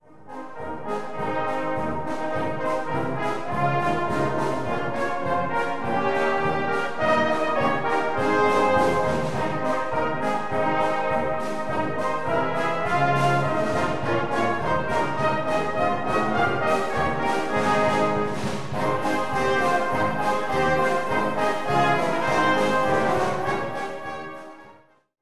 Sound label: jingle bells, brass band